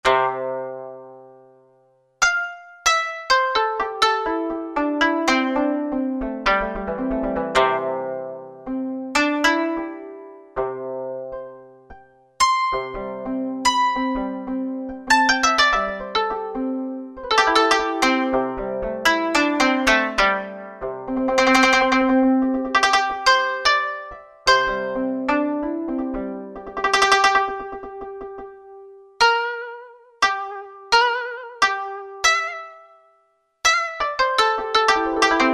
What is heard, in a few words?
Koto; Roland SR-JV80-05